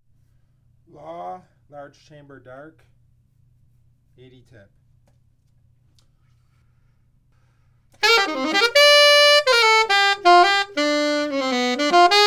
Alto Jazz; LAW LCD

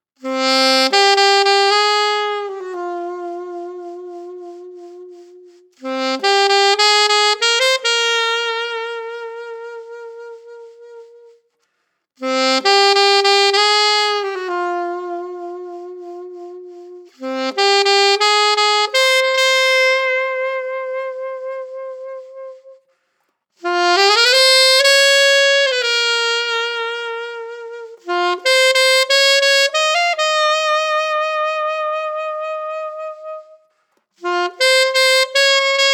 Alto Reverb, alto saxophone mouthpiece

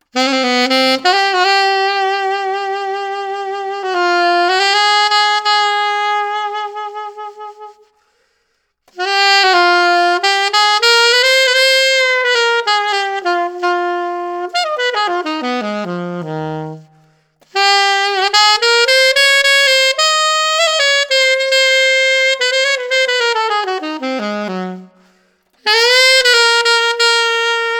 Burnin Reverb, alto saxophone; bettersax burnin metal alto saxophone mouthpiece

